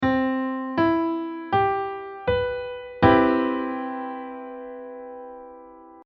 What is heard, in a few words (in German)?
Maj 7 Vierklang; musiktheorie; intervalle; gitarrenunterricht